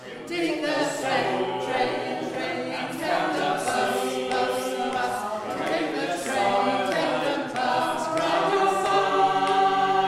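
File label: all rotating parts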